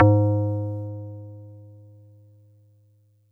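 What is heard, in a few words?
Marimba DX100 G#1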